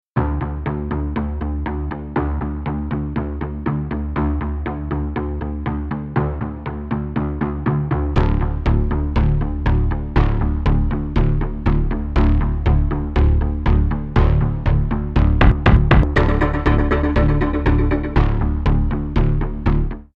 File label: tension music ringtone